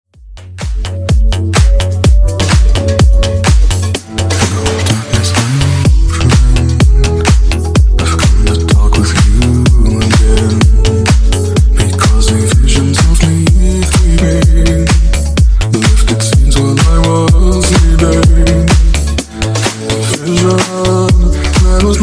funk, 126 bpm

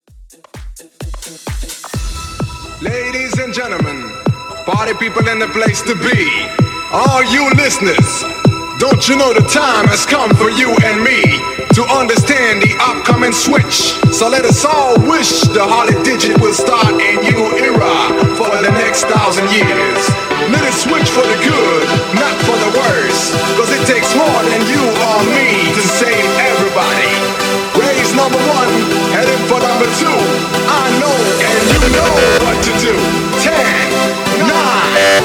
house club 129 bpm

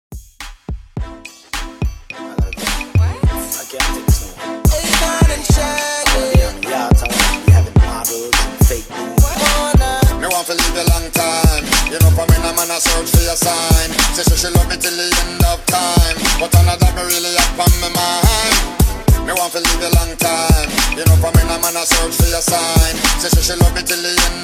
R&B Re Drum Clean 106 BPM

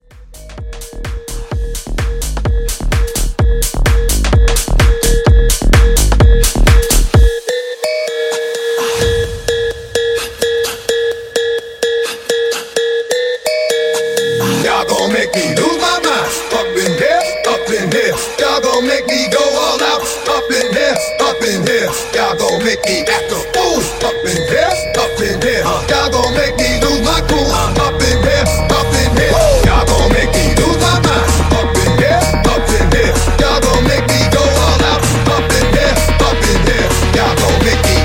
House Mashup

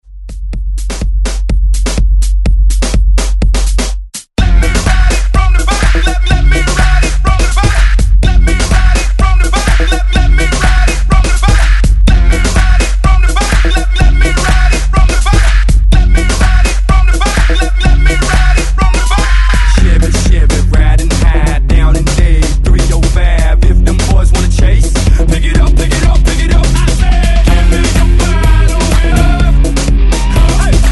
Hype Re Drum 125Bpm; DJDOLLS

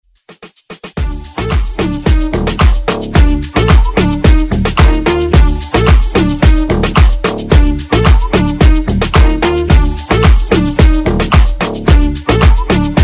Moombahton Ext Redrum

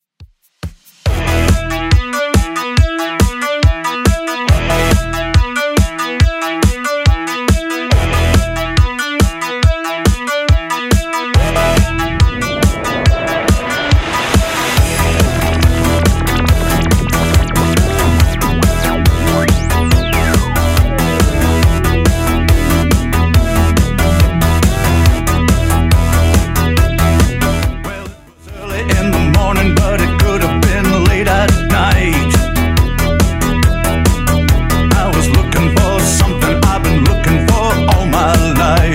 2Ks Redrum 140bpm

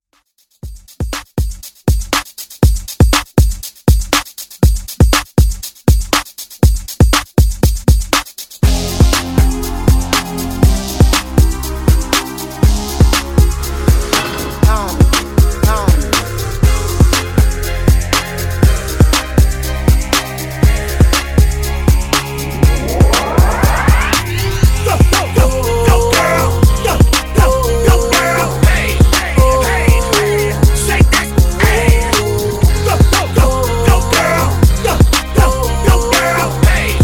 Hype Redrum

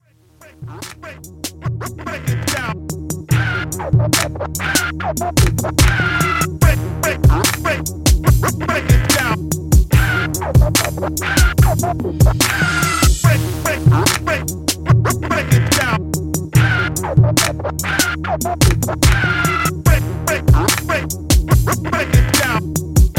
in outro scratch 145 bpm

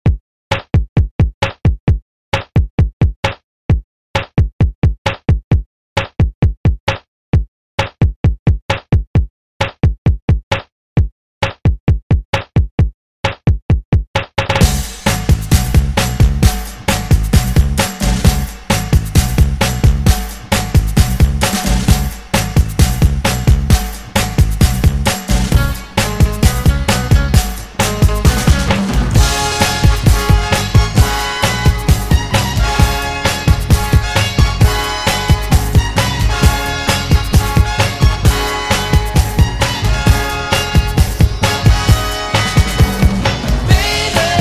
Funk Motown Redrum 132Bpm

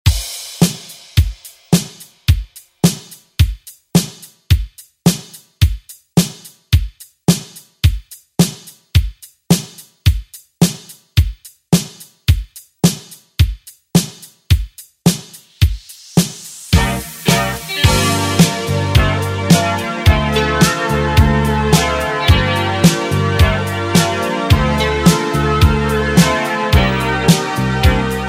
80s Re Drum; DJ Mhark

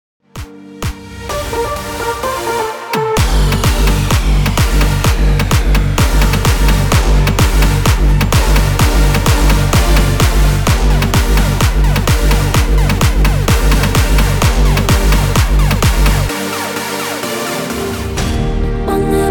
128 BPM

